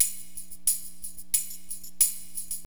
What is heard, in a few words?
TAMB, Perc